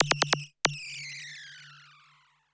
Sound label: SPACE FX 140; SpaceSounds